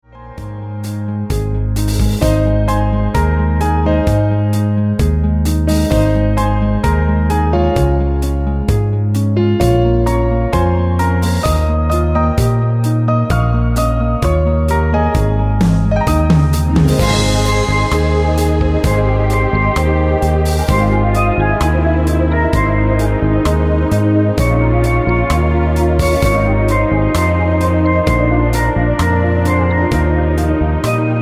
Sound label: Jingle Bells